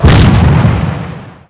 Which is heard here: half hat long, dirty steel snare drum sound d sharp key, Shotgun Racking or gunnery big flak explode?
gunnery big flak explode